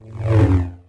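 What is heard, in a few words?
sith lightsaber Swing; weapons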